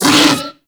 Kubrow Armored Vocal Pain; Warframe

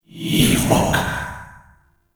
Grimoire Alt Fire Whispers; Warframe; Tenno

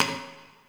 Idle Heavy Hoof Stomp; Tagfer; Warframe; Ambience; Entrati

